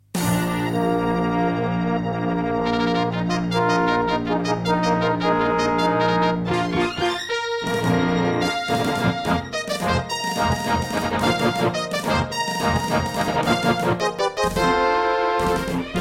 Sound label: Intro, Aztech Sound Galaxy Wave Power; Dark Forces